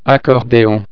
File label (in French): accordeon